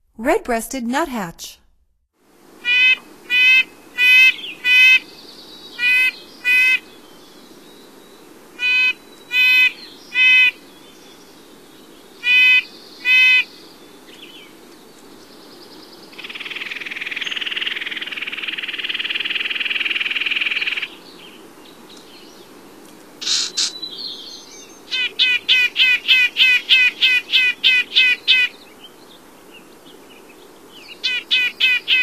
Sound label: Red-breasted Nuthatch, Songs of Birds of North America; Stokes